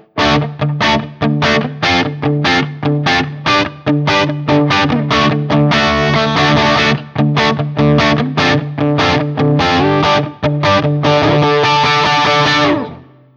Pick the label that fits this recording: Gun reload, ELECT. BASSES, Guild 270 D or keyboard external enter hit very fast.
Guild 270 D